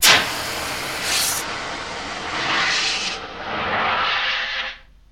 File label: Fire Extinguishe; fire extinguisher